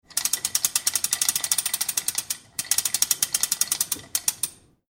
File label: Chain Through Pulley Slow Speed; Metal Sound Effects; LYN103 package of Chain Sounds; Lynne Music